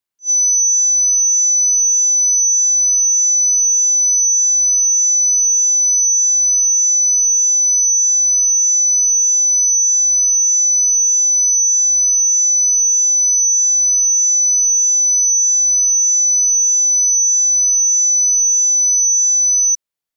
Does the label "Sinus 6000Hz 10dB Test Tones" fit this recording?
Yes